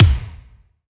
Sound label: Kik Hall, Bass Drum Kicks